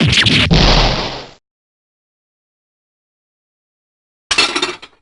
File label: breakdown